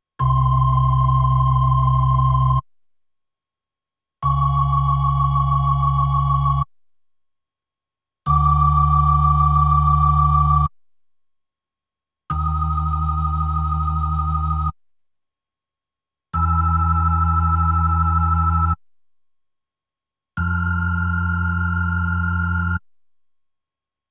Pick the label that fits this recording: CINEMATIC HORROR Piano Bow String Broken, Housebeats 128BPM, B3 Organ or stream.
B3 Organ